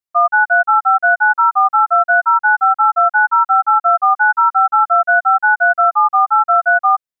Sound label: dtmf